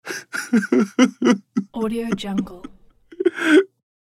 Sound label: man whimper crying